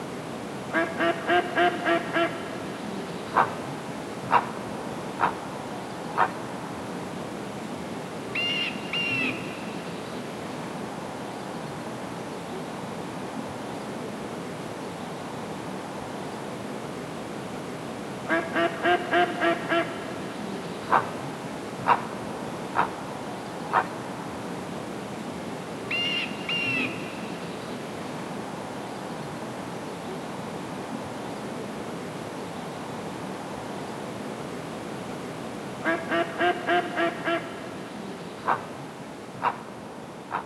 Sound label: Marsh Foggy Low Birds; Remington Great American Bird Hunt; Wii